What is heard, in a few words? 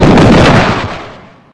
railgun; space-exploration 0.5.80